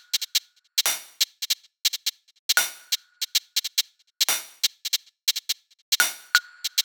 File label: Bpm140 Shake Perc Loop, Dubstep; Perc Loops